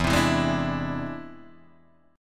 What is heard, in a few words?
E Augmented 7th E